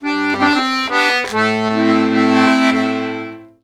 Accordions; USB Soundscan vol.40; AKAI

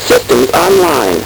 LSDj, Nintendo Gameboy; 300 Drum Machines

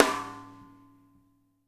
SHUFF SN, SNARES; Spectrasonics; Burning Grooves

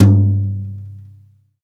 TOM XBRUSH, Brush Kit; Club-50 - Foundations Roland; Brush Kits

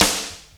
SD, HIP, Dance Mega Drums; 90 s Sample CDs; Best Service

